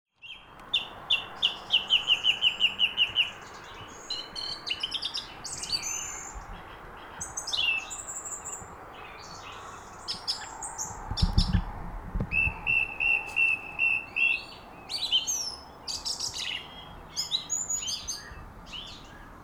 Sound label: amazing dawn chorus